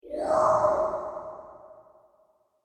Whoosh Transition Materialize Airy Vocal Element Reverb; Whooshes; Sound Design